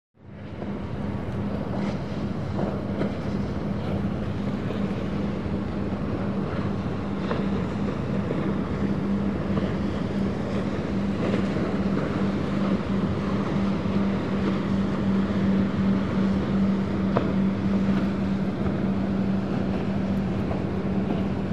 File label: Harbor Ambience Boat